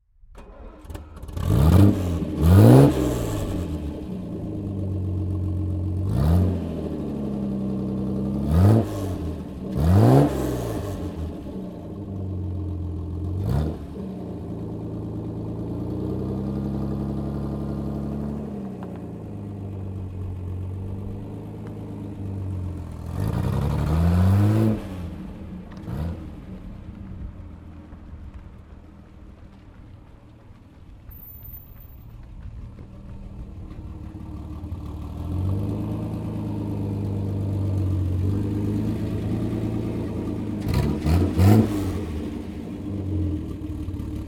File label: AH 100 Six; Healey